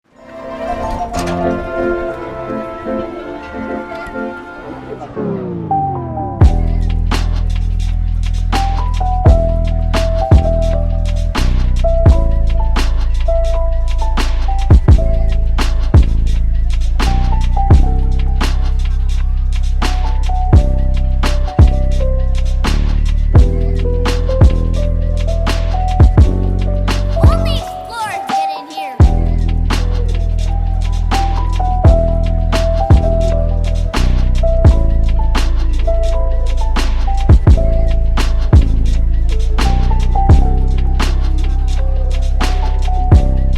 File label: lofi; up soundtrack